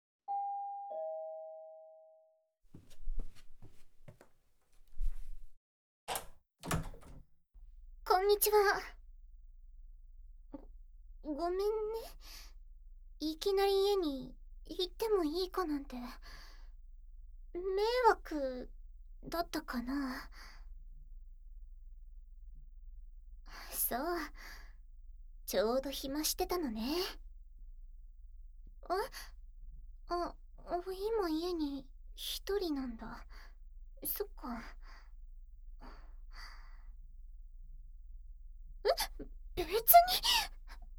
キス・耳舐め, ASMR; ツンデレ幼馴染に耳舐めされるはなし～キス・耳舐め・手コキ～（SEあり）

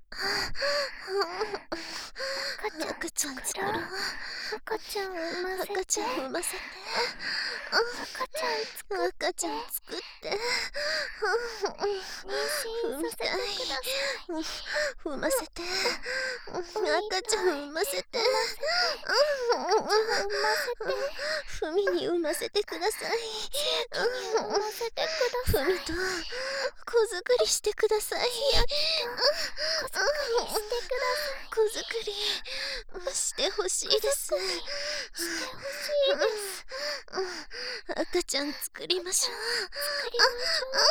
喘ぎ, バイノーラル; ハーレムオナサポ 4人のメイドがご主人様のオナニーをお手伝いするバイノーラル～文、千曜、名月、莉桜～; 赤ちゃん作ろ♪

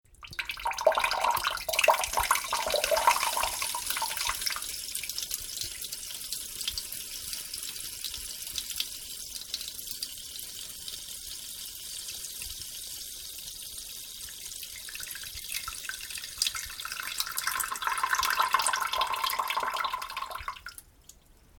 ちょぼちょぼしょーおしっこ

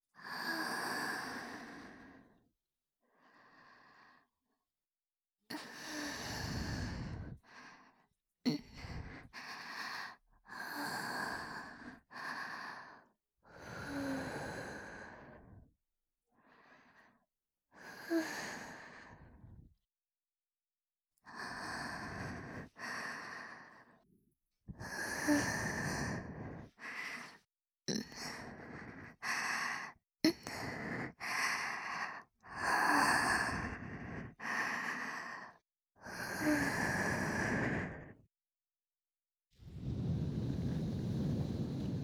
吐息・息吹きかけ集; 夢魔の世界～夢魔の触手耳責め地獄～

